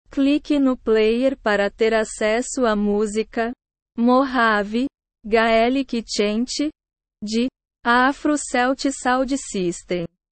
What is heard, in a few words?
mojave gaelic chant